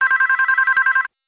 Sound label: Bell; Audiovox; CDM-8940